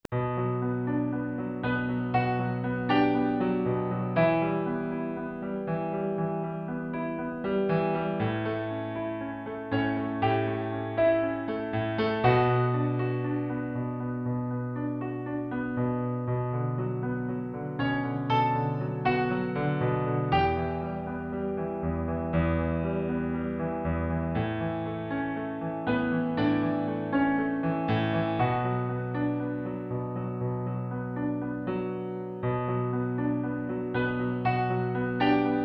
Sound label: piano